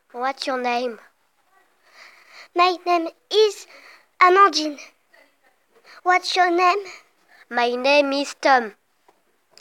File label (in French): vocal